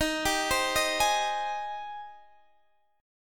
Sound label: G sharp Major 7th D sharp